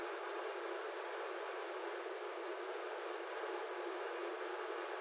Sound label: office room tone, ambient tones